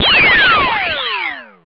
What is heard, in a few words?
Laser; Roland BK-5